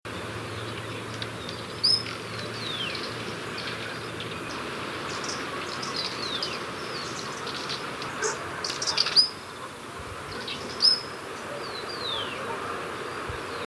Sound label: giant hummingbird